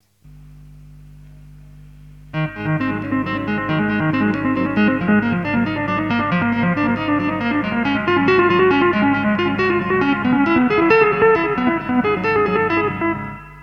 Maxon AD999 Analog Delay, SLAPBACK ECHO; DELAY TIME5〜6 REPEAT0; DELAY LEVEL8; これを挟んだとき、ONにするとギター音量がパワーアップされる。

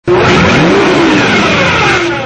corvette, engine; chevy

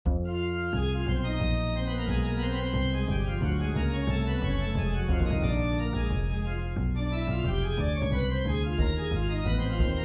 12 bar

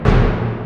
Drum One Shot

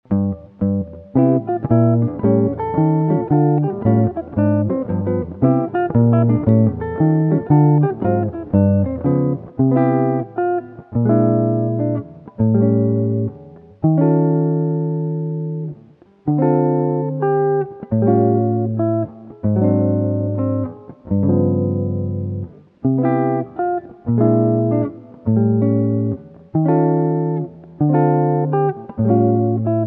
S Guitar

